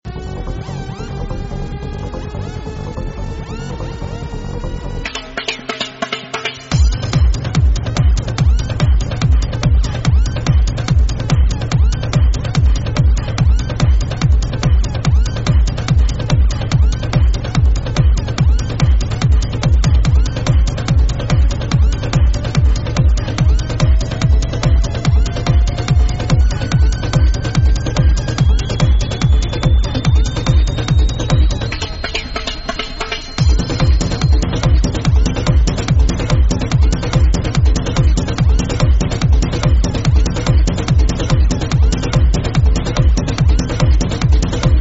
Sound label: Electro